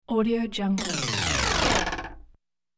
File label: descending zip gliss; sound-ideas